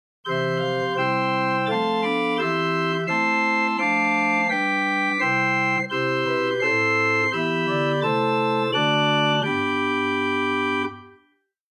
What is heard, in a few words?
Bw Gedackt Prinzipal; Grace Lutheran Champaign